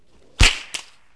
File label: slap, impacts; Wired for Sound Pro CD; Aristosoft, Inc.